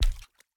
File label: Maneken Step Soft Water; Game rip; MiSide